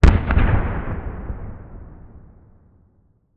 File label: Explosion Distant Cannon; Fire and Explosions